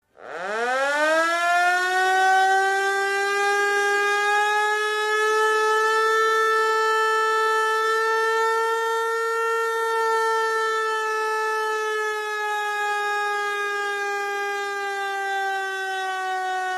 Siren Old Style Alarm

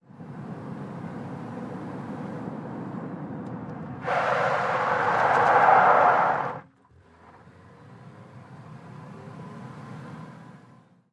Sm Car 180 Skid, compact

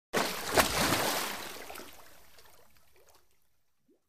Swimming Dive Splas

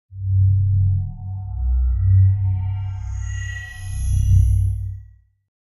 Magnetic Feedback Wash with LFE

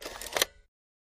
Calculator Sharp Cs, adding machine

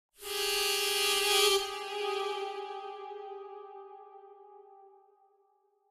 Mouth Organ Steam, harmonica; woodwinds

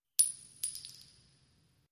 Shell Casings Single

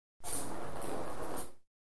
Ping Pong Ball Roll

